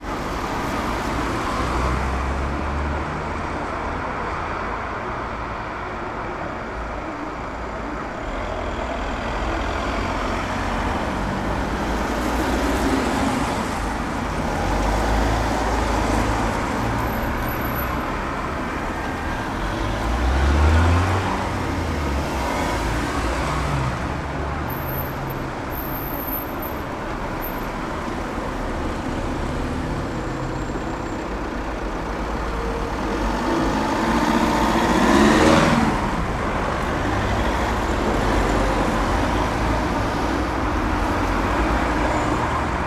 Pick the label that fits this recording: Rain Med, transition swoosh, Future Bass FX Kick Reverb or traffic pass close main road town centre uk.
traffic pass close main road town centre uk